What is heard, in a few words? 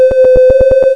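tel, telephone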